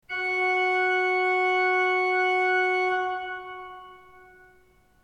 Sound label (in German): ton, orgel